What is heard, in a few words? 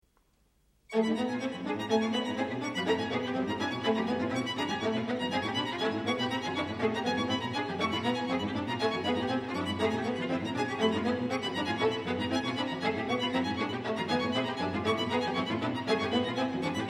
String Quartet